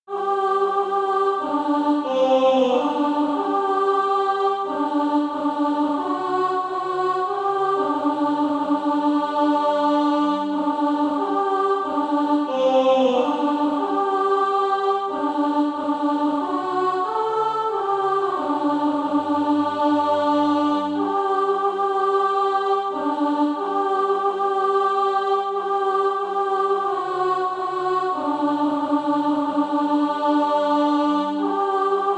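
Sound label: alto, SATB Practise Music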